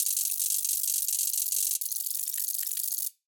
Calculation digital processing, UI sounds, futuristic; Sound Ex Machina